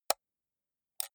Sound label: computer mouse right click slow hard